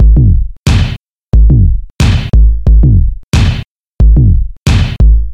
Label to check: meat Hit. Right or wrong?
Wrong